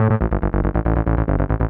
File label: SNTH BASS ELCTR 140 A, Techno